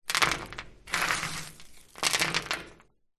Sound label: foley small stones debris dust handful sprinkle drop metal surface; zapsplat